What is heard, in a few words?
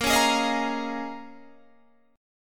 Bb Minor 11th Bb down Guitar Open G